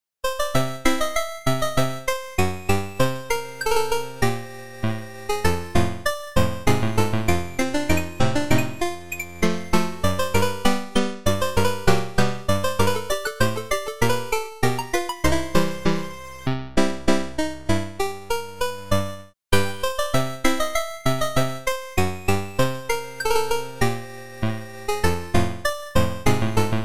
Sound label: 8-Bit Theme; Derpy Hooves